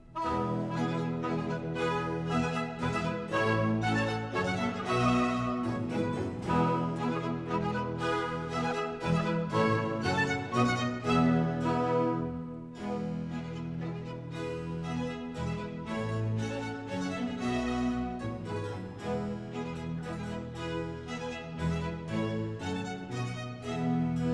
Hornpipe; 2nd Music Second Hornpipe; Sir John Eliot Gardiner, Monteverdi Choir, English Baroque Soloists; Semi-opera Z. 630, 'The Indian Queen'

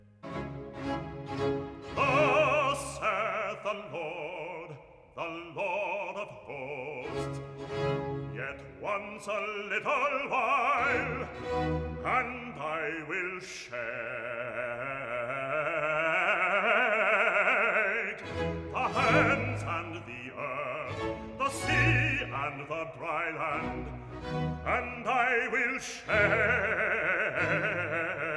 Recitativo (Bass) "Thus saith the Lord of Hosts"; Messiah

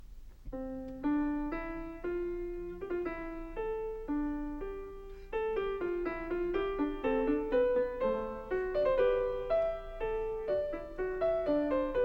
Fugue No. 1 in C major; BWV846; Bach; Glenn Gould; The Well-Tempered Clavier; WTC Vol I

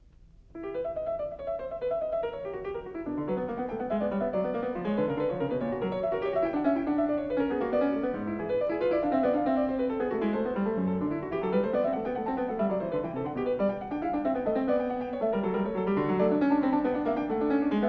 Fugue No. 10 in E minor; BWV855; Bach; Glenn Gould; The Well-Tempered Clavier; WTC Vol I

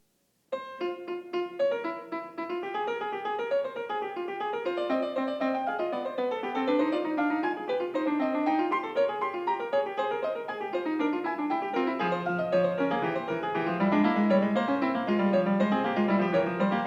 Fugue No. 12 in F minor; BWV881; Bach; Glenn Gould; The Well-Tempered Clavier; WTC Vol II

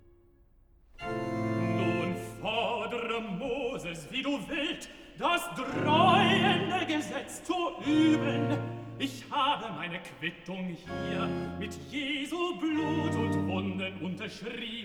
Recitativo (Tenor); Cantata, BWV 145 'Ich lebe, mein Herze, zu deinem Ergötzen'; 'Nun fordre; Bach; Eisenach; Easter Mon & Tue

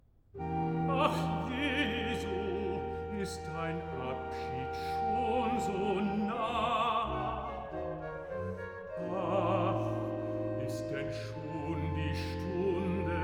Recitative (bass); Johann Sebastian Bach; Lobet Gott in seinen Reichen BWV11; Archiv

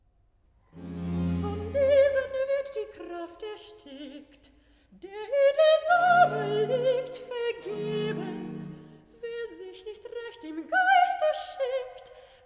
Recitativo; Cantata, BWV 181 'Leichtgesinnte Flattergeister'; Bach; Southwell; Sexagesima

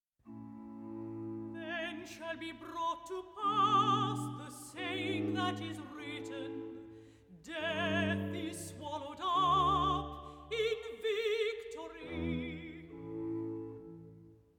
Recitativo (Alto); Messiah

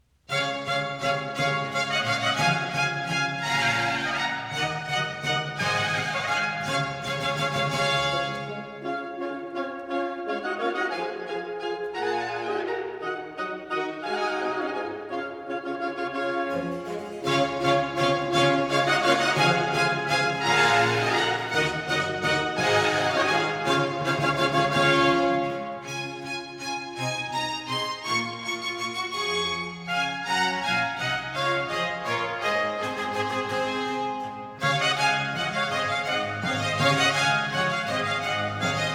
Water Music Suite No. 2 in D major Minuet; English Baroque Soloists, Sir John Eliot Gardiner; Suites for Orchestra HWV. 348, 349, 350, "Water Music"

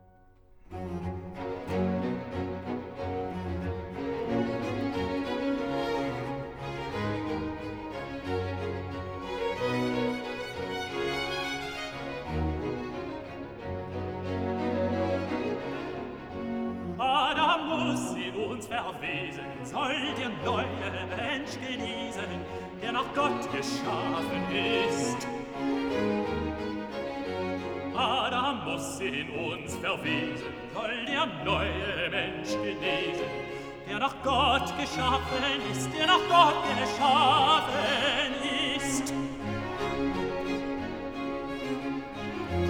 Aria (Tenor); Cantata, BWV 31 'Der Himmel lacht! Die Erde jubilieret'; Bach; Eisenach; Easter Sun & Mon

